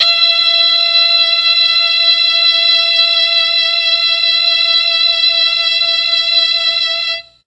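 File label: E4 strings brass, mellotron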